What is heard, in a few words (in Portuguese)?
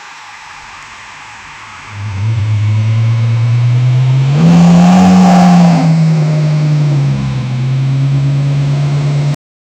simule um som de motor, sports car